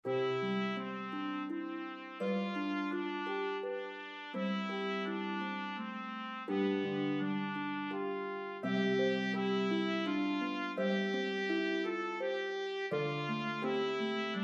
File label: Trumpet Harp, Duet for Bb Trumpet & Harp, French Lullaby; This French Lullaby is perfect for setting a calm, meditative ambiance.; Infant So Gentle